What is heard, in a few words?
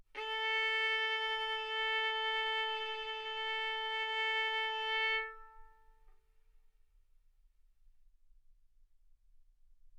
Violin arco mf sul A A4 B4 stereo